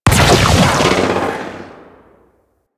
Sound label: Opticor Shot; Warframe; Venus; Venus Sniper Spaceman Agent